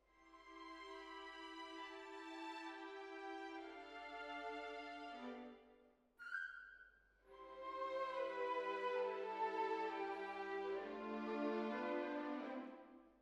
Hall, Jazz for piano

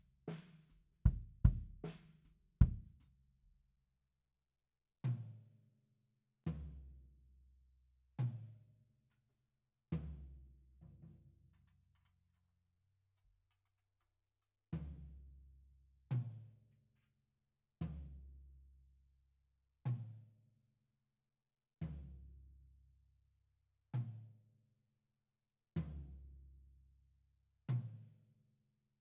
BD OUT

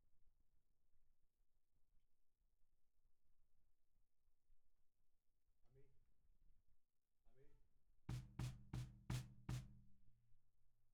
Snare Bottom

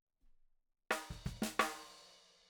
Snare Bot

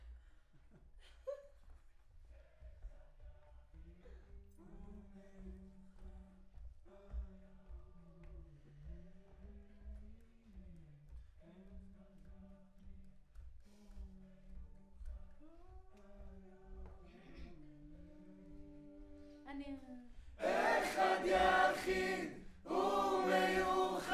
Choir; Decca LR

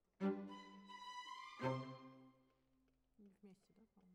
Cellos

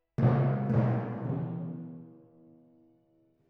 Timp

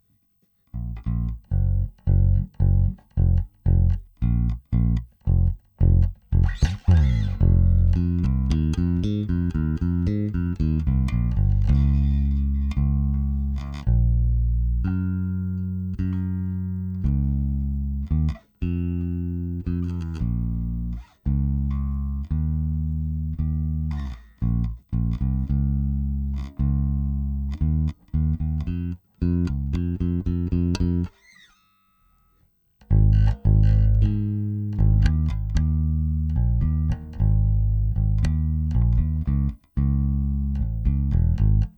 EBass DI

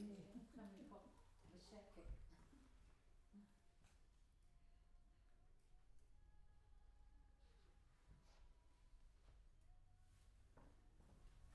Room, Choir

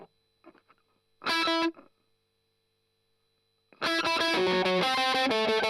Strum Octav

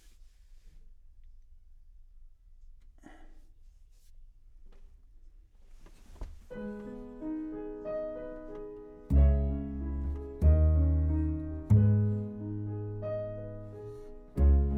CBass

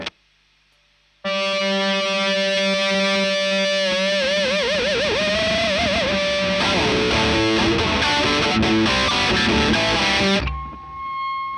Marshal Gtr Line

